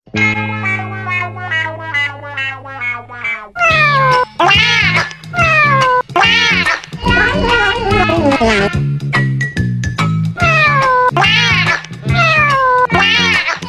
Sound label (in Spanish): Sonidos de gatos